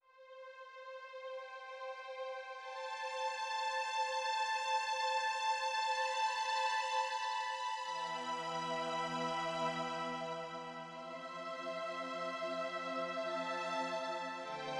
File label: CA95 Strings